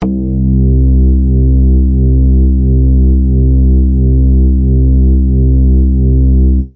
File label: superlong juno bass; Basses